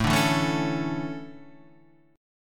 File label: D Major 7th Suspended 2nd